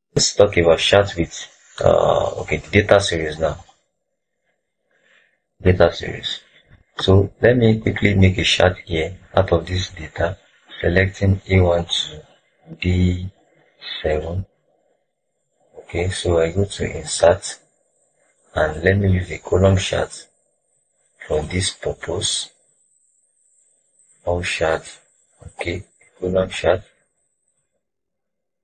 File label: vocals